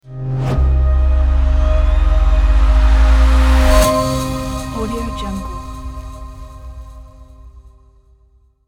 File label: fire swoosh logo reveal